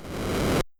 fire; mother1

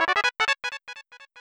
Learn PSI; mother2

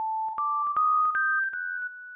Spooky; mother2

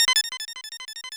Flash; mother2

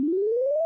bubble monkey floats; mother2

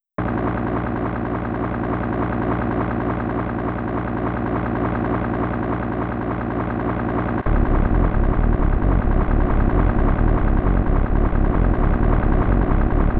Helicopter; mother2

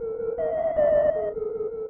Possessed & Ghost sound; mother2

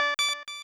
button; mother2; Sphinx

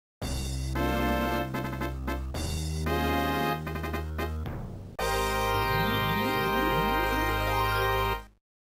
Fanfare; Mother 3; Noble Vase

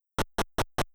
Staircase; mother1

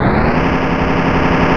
bulldozer start; mother2

